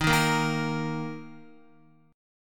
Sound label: D sharp 5th D sharp, Guitar; strummed; JGuitar